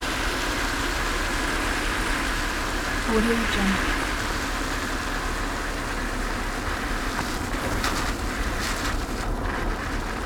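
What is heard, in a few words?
wet tire constant exterior fast driving rain soaked road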